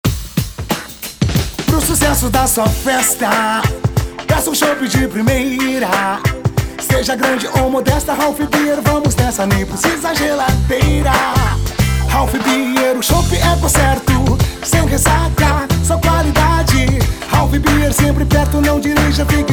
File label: Jingle; Ralf Beer